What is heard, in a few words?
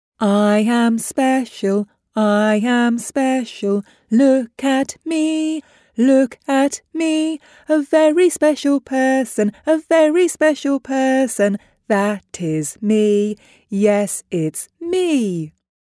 Singing